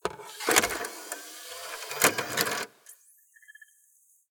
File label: Insert Cd Into Car Player Interior